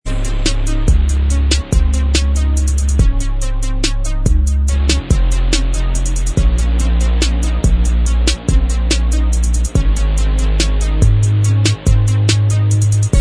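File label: instrumental, backing tracks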